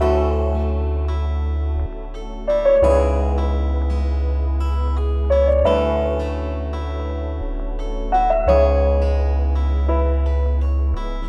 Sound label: A 168bpm Full Mix